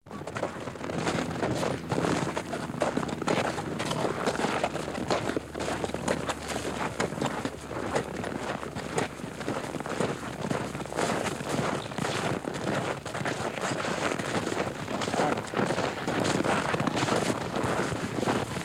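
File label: horse walking on snow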